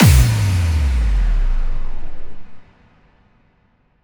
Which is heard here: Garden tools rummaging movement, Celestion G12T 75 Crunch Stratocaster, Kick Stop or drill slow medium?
Kick Stop